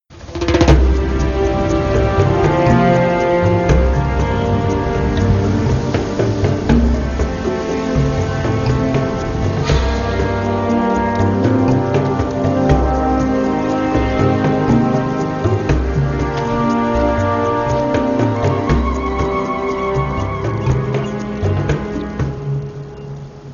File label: Walking BGM